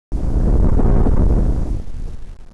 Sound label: THUNDER